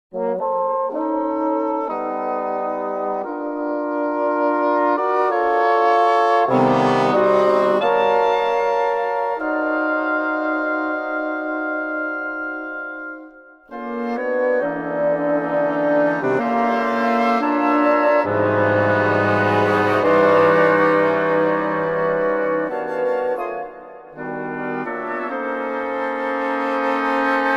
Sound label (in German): Melodiechoral; gullinkambi; 2-7stimmig